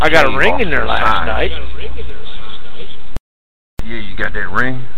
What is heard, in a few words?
rrrrrr